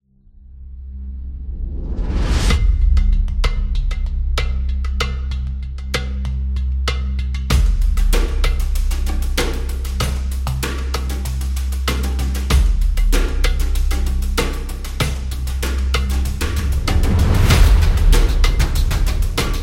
These Are the Drums; drums trailer

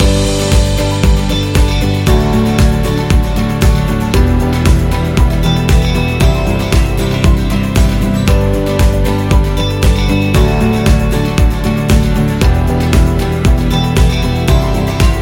Upbeat Loop Corporate; technology

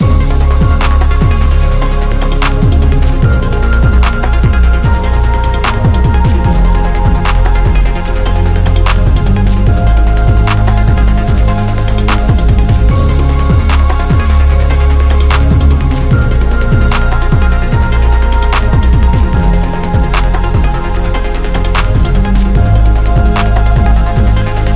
Information Loop

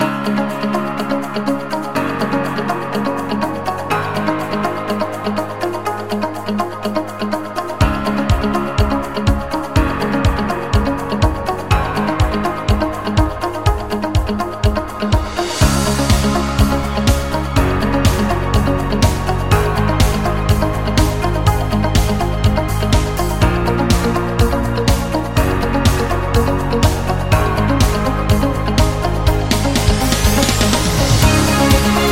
School Upbeat Corporate; business background

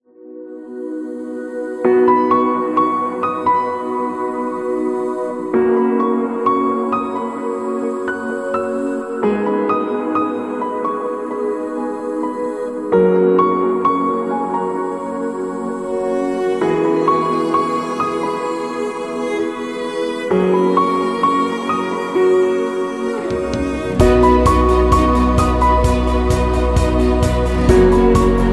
Piano Inspiring, corporate music; corporate music vol 15

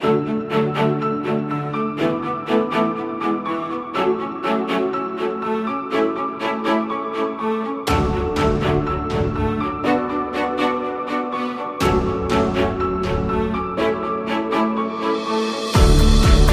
Inspiring Beat

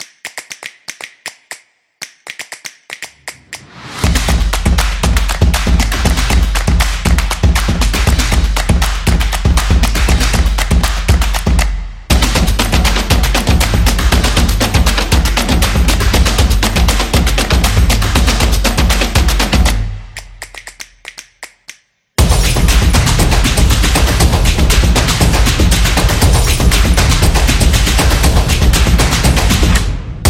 Energetic Intro; crossfit

